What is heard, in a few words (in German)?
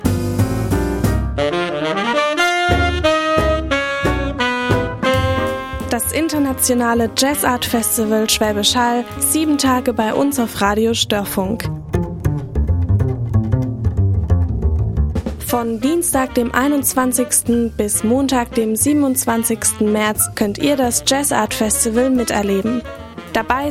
jingle jazz art festival